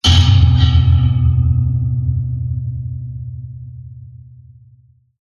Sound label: Metal Shelf Hit; The Metal Shelf